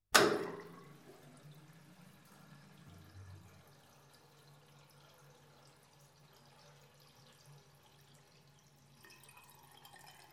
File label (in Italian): ACQUA LAVANDINO